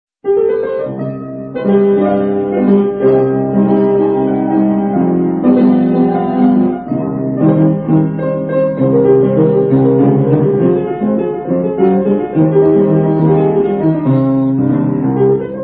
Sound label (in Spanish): piano